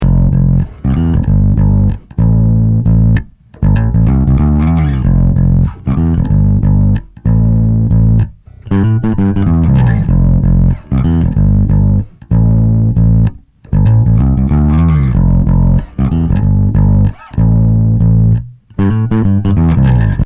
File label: Bass; Two Notes Le Bass